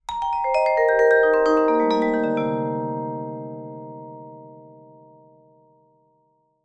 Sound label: VIBE LICKS; Sampleheads - Dave Samuels Marimba & Vibes